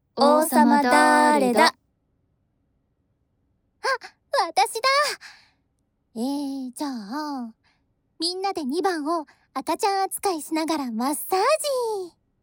『赤ちゃん全身マッサージ』, ASMR

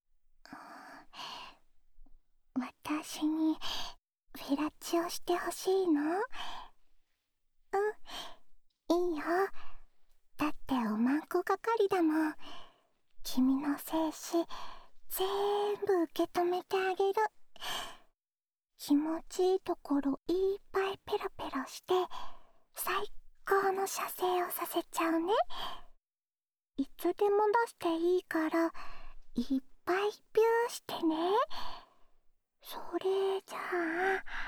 オナサポ用フェラチオループ, ASMR; おま○こ係２

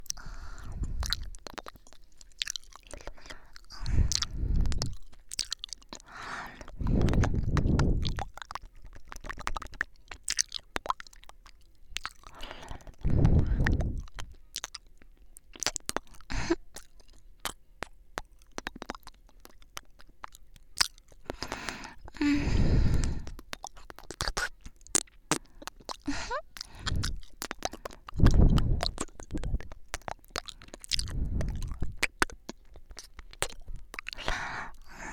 左耳舐め

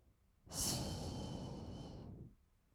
吐く音, ブレス, kawaii voice; ASMR; 1200 kawaii voice!!

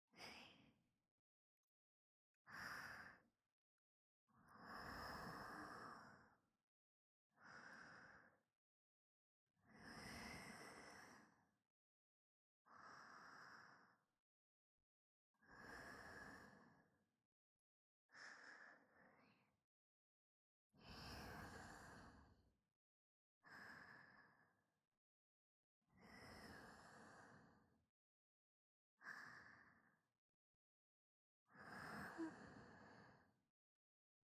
寝息ループ, ASMR

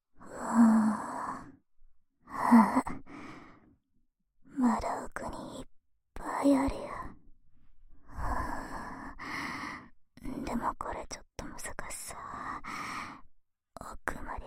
【右】奥耳かき, ASMR; 耳かき小分けトラック

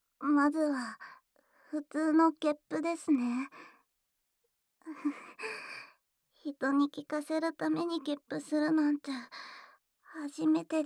基本ゲップ; 実技面接1

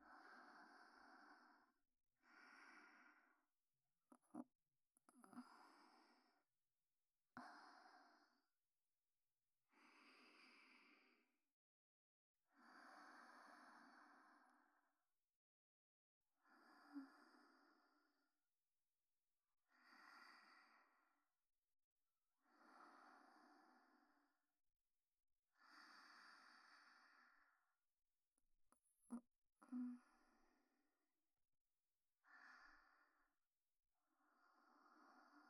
寝息

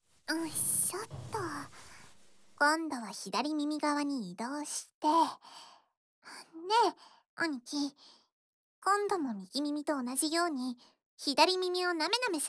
おまけトラック・耳舐め左耳

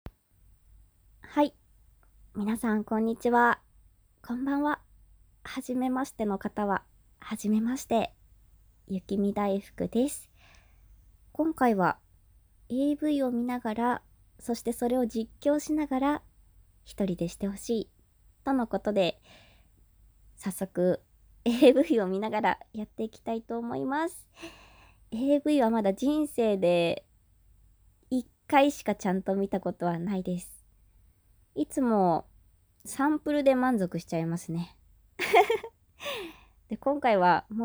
オナニー実演 連続絶頂; アダルトビデオを実況しながらオナニーしてもらったら連続絶頂で最後はぐったり疲れ果ててギブアップ; 現役声優

